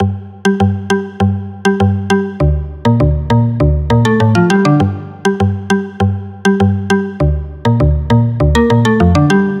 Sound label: Loop 100bpm G Basspluck Bounce; Ghosthack